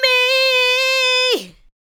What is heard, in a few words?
ADLIBS